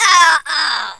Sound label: pain; alienarena; invader